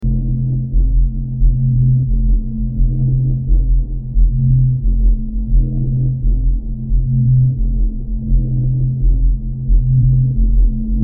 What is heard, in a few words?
cyberpunk city ambience